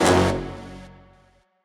Stab Vinyl Brass F#

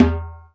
Timbale Skin; Drums; Misc Percussion

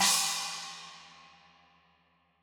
Crash China Edge, Cymbal; One Shots; Drums; Ableton Live 12 Lite; Core Library

